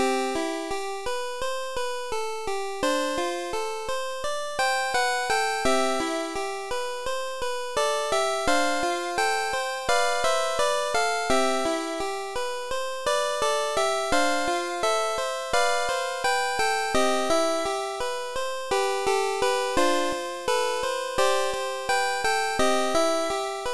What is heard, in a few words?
Radio Lullaby GB Sounds; Pokémon HeartGold & SoulSilver